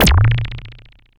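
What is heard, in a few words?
FX left, attacks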